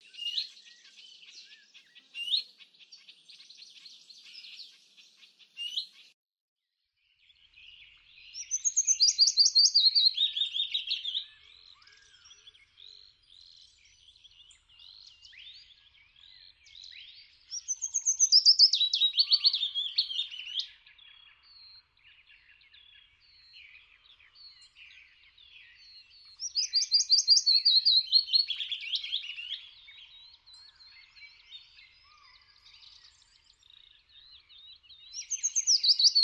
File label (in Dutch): Fitis